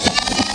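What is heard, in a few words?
pickup, weapons; SINDEMO; player; shield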